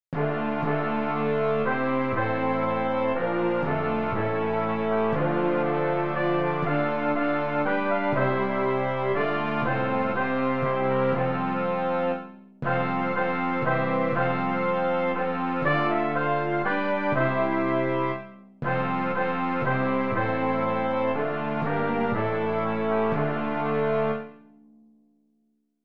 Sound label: Hymn BRASS